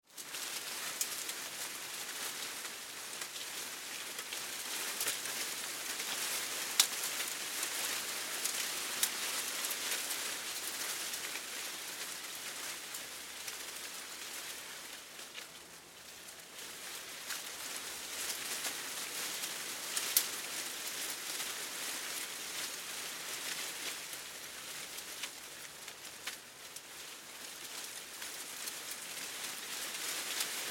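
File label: Leaves Medium Leaf Wind Moves Rustles Snaps; Weather; Big Room Sound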